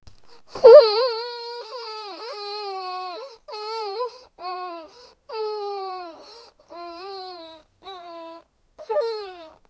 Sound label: sweetly baby crying